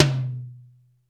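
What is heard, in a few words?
tom, 60s kits; 90 sSampleCDs; Crypton - DrumBase5000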